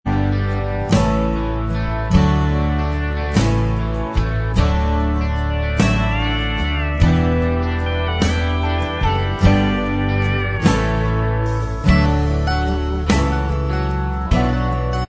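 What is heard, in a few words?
instrumental, track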